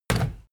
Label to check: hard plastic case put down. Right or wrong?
Right